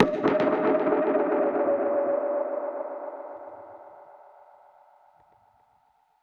Perc Hit, dub percussion one shots; musicradar

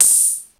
CYMB; Crypton - DrumBase5000

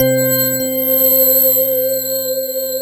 CRYS ORGL C4; ORGEL; Synth

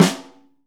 LUDWIG, DRUMS; Best Service; Alpine Volksmusik

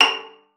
PIZZ, VIOLIN

